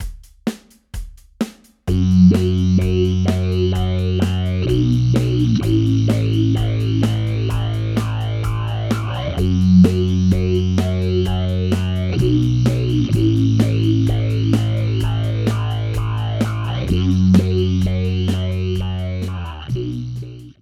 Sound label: beat driven lfo sync, bass